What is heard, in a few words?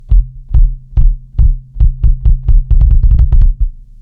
kick Kick Open w Tone; Joe Chiccarelli